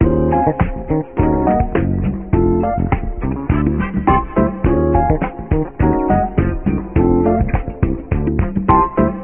keys dope beat; Loops; Phonky

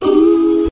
ooo, Voice

